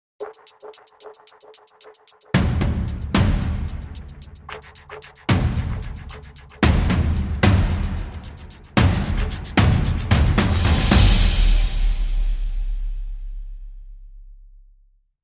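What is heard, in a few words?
Dramatic Sting, No Orchestra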